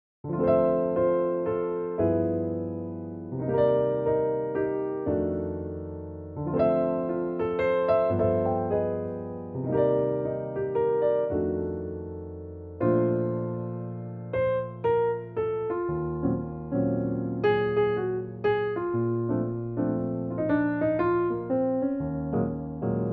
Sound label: Jazz Waltz